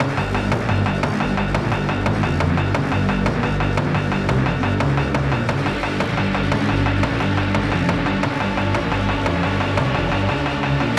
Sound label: Music Song Intro; Hellbound